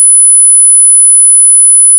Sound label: sine 1000 mono